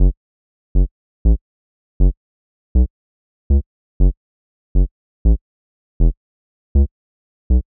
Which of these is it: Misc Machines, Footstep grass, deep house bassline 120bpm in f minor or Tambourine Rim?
deep house bassline 120bpm in f minor